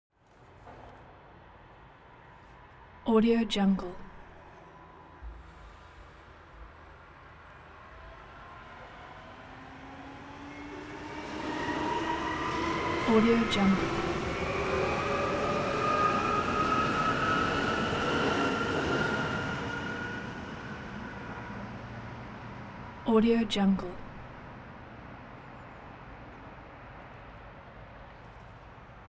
transportation train city train accelerate depart long